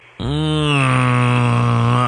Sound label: Weird Sound MOTOR; WFAN